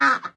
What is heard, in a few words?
Goose Stands Scream